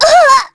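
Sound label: Vox Damage, korean; kingsraid; voices heroes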